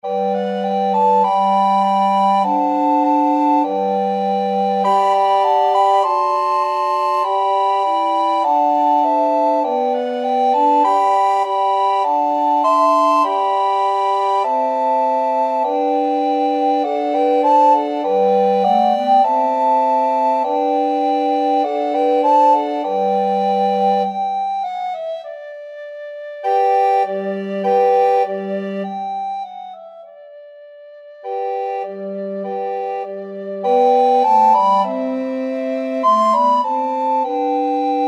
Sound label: recorder quartet